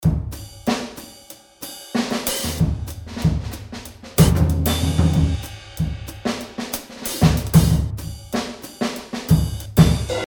breakcore breaks; DOA breaks; ephemera breaks

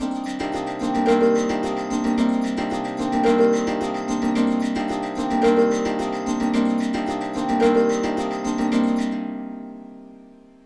Trance SYNTH SEQ, 110; AMG; Global Trance Mission VOL-2

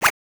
Tech Zip, Genesis; ToeJam & Earl 1

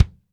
FLUFFY K, DRY KIT; Spectrasonics; Burning Grooves

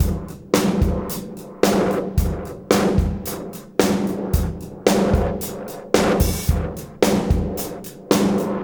Beat Loops; Zero-G; Monster Beats

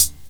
HH, HIHAT HIP, Dance Mega Drums

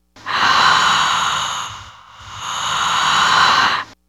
HED-SCREAN; Rubber Chicken Software Sample Bank

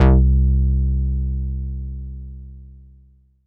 Italiano c3, Korg Poly-800

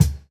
Kick, jungle breaks drum sounds

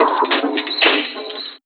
Vinyl Bumpers, Fx; Sonic Foundry (Sony Creative Software); Esoterik Beatz

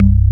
ORGAN C 1; VOCAL ORGAN

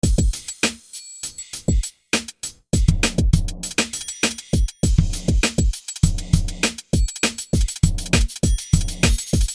Beat

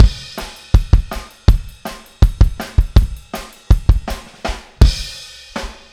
Kick, Drums, 162 BPM; Take Your Toms Off

